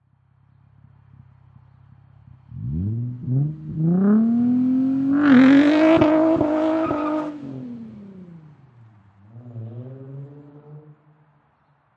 Infinity G35 Exhaust Takeoff, Auto, Power Car, High Performance Car Sound; Infinity G35. Exhaust perspective. Take off, Takeoff, Accelerate, Drive away